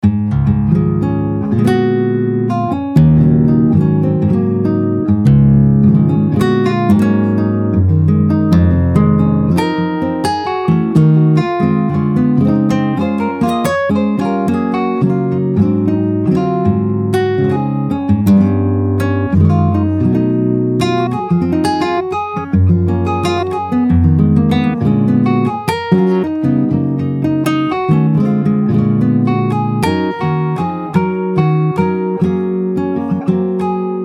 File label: Classic Guitar Jazz